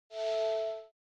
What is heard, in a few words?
Charging started; Audio themes